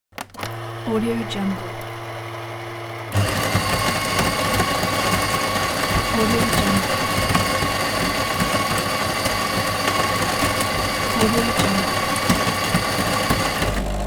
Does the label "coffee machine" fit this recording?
Yes